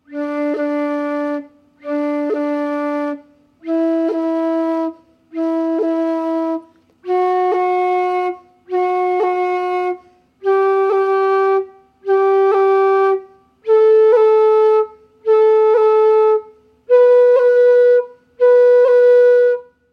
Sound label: cut, irish flute